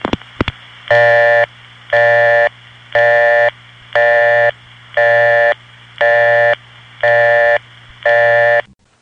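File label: busy signal or call rejected sound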